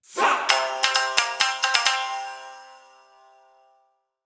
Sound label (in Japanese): 三味線ジングル